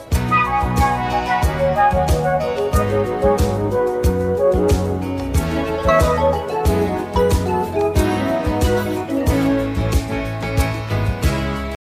africa flute solo

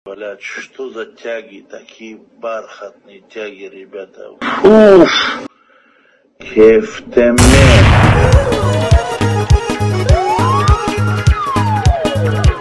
bassboosted

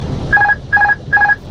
airbus

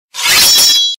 sword sound; roblox

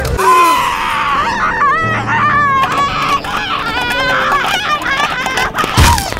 k o scream; htf petunia

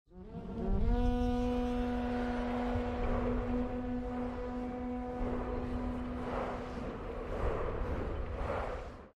alert sound

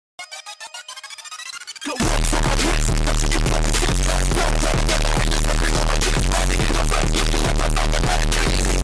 lamborghini bass boosted

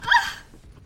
moan

